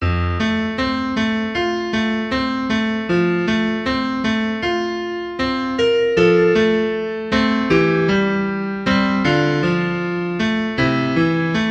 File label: Piano Ver.